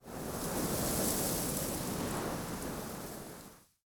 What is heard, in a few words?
Forest Open Glade Wind Gust Dry Grass, Ambience, Day